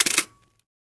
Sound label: Antique Camera Shut, shutters; shutters & movement